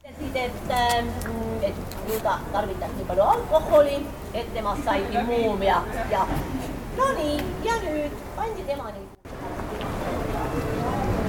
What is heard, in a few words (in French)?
tallinn balade